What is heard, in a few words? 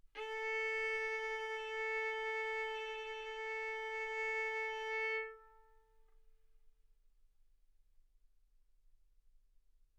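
Violin arco mf A string A4 B4 stereo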